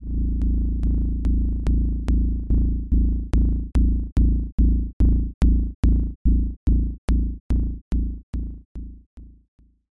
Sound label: beat